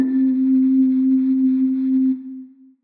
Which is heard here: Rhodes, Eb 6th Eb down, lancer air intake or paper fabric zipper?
Rhodes